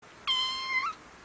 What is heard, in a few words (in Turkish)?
yavru kedi sesi